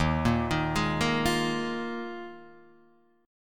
D sharp Suspended 2nd Suspended 4th, Guitar, Half Step Down